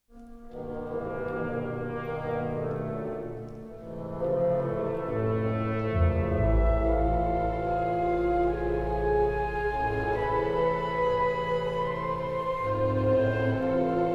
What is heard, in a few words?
City - Ambient; The Senate